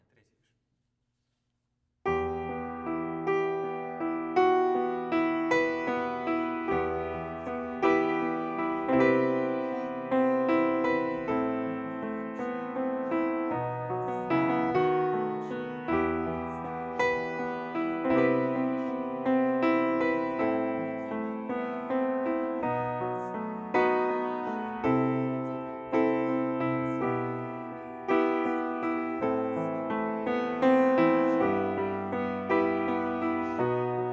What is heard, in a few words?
piano